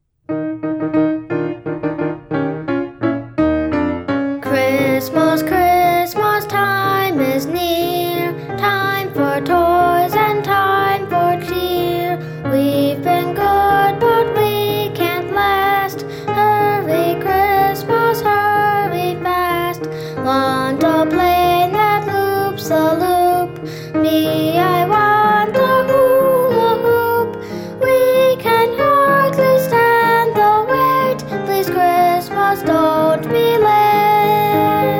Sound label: chipmonk vox